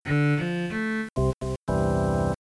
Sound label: auditory earcon open paint